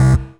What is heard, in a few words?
Synth Bass; Vengeance Samples; VEE Synth Shots